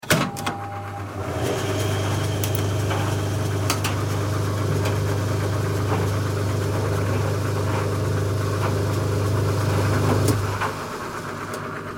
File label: Tumble Drier, Turning sound of a domestic tumble drier.; Laundry; Household